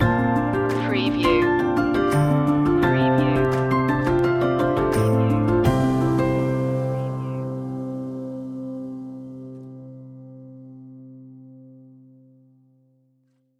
right track stinger

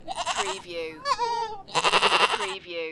Goat - Three Baby Goats

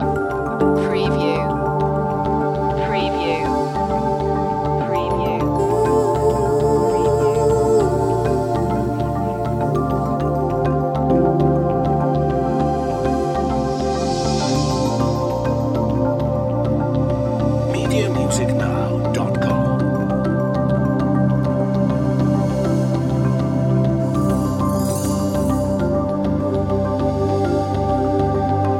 laguna dreams no drums loop

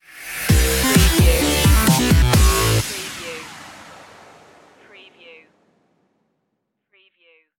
flying chords stinger

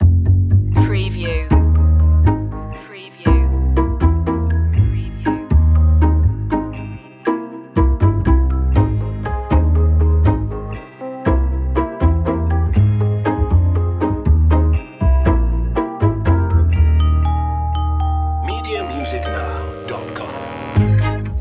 funny mystery no drums

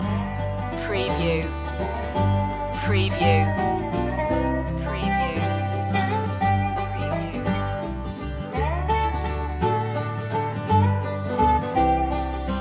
waltz loop

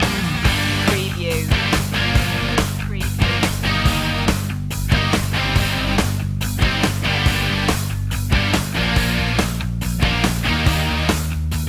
hair metal duke loop

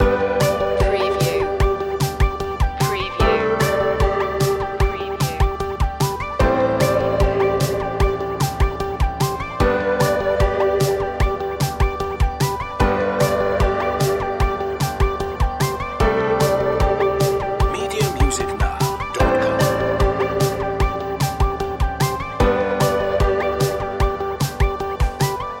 a clear mind loop

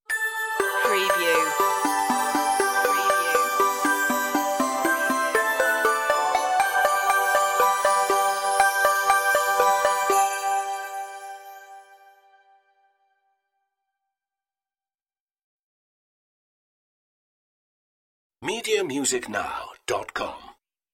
merry christmas synthified stinger outro

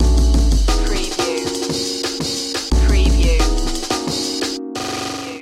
fast loop a

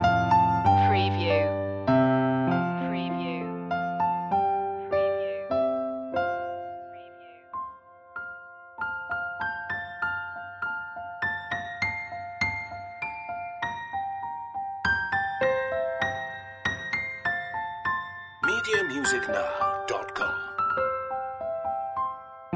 away in a manger piano; media music now

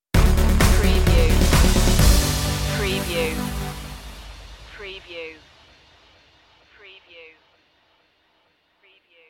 well meet again stinger

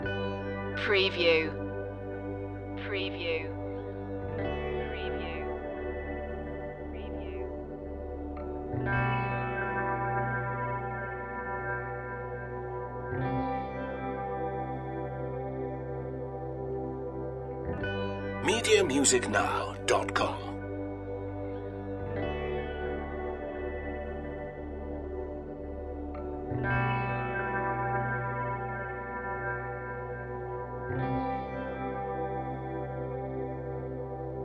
ambient flow loop

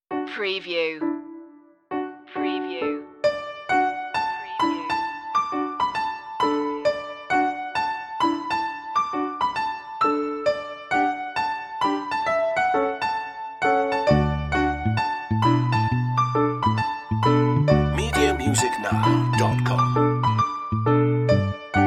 wishing tree no guitars

